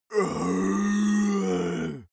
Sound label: monster growl hoarse; comedy-cartoon; soundpool